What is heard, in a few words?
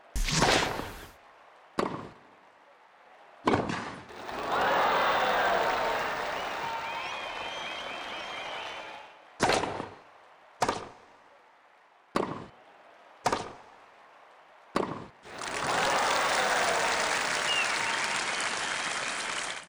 Boot Sound; mario tennis ultra smash 2015 wii u gamerip